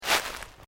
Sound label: Footsteps Sneaker Leaves Deep Step; Footstep Foley; Human